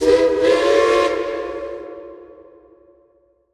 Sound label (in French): coup de siéréme train vapeur